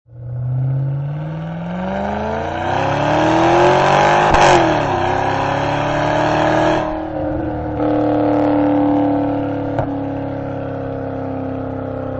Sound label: pipes accel